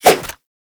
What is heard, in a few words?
Nunchaku End Whoosh; Warframe; Tenno